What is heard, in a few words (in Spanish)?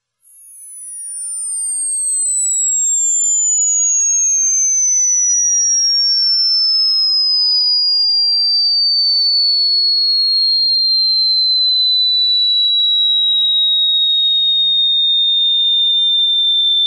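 silbido perro; silvido perro; sonidos insoportables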